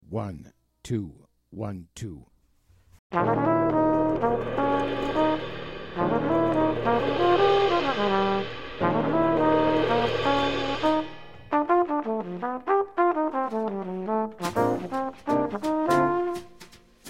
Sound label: no bass